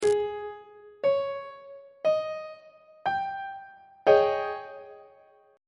a flat major 7th suspended 4th chord on treble clef